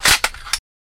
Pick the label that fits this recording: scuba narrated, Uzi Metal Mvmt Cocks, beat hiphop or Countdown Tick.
Uzi Metal Mvmt Cocks